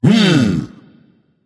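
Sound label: tongue, non-verbal; smite; voicepack; merlin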